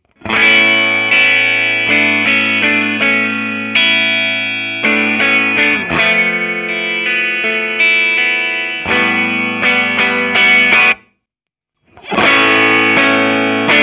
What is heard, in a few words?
Strat Vox